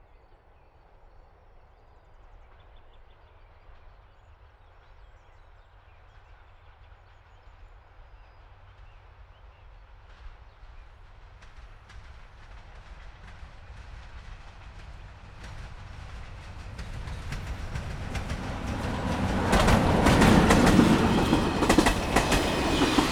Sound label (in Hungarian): Vízvárra tartó vonat, vonat átjáró; cardioid